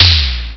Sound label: boom; PowerMonger